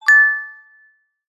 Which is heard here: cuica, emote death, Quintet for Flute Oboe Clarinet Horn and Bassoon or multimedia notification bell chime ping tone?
multimedia notification bell chime ping tone